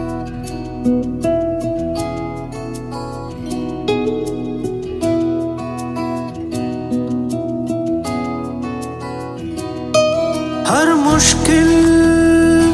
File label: ringtone bollywood ringtones